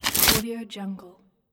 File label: rustling bushes